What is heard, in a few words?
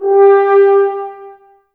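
brass horns G; Sample CDs; Miroslav Vitous; Woodwind Brass Ensemble; F.Hrns Links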